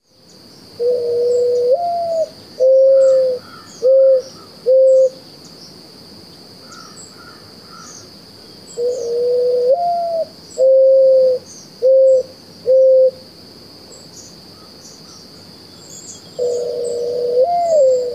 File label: morning dove song